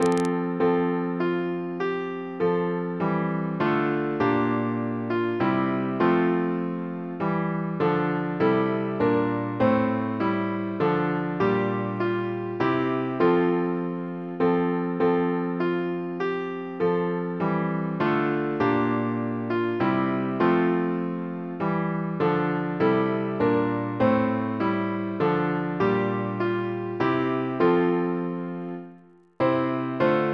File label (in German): choral; Advent; Blaeser